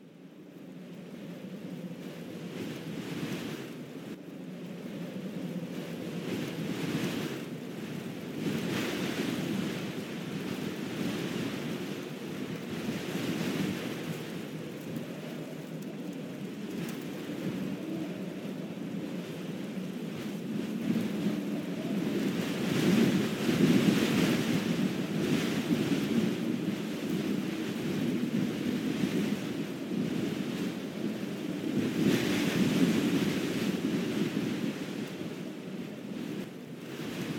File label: Vent canyon